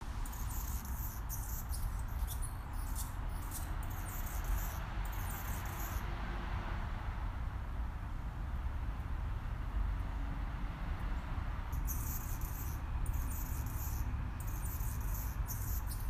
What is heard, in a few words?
humming birds